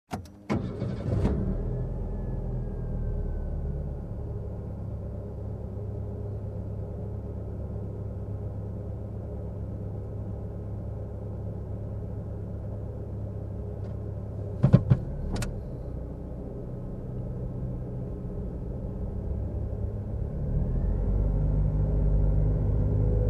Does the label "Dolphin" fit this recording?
No